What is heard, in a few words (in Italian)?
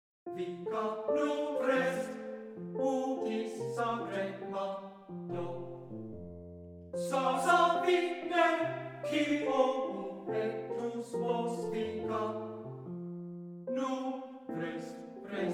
lied per voce e pianoforte; Caroline Pr. de Saxe; An die Buche